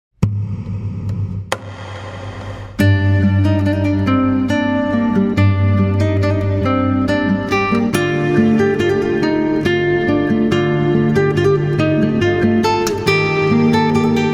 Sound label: Guitar Instrumental